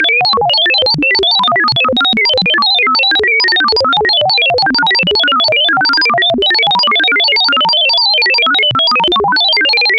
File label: Laboratory